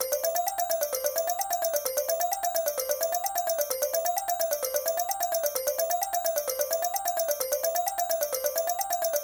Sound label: spin, slot machine